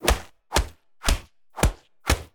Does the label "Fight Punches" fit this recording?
Yes